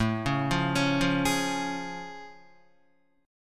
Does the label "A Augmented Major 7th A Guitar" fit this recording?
Yes